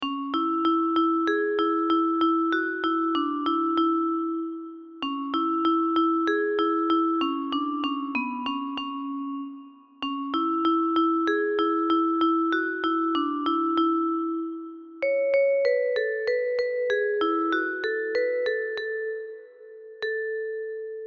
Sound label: Karaoke; Ko e Kosipeli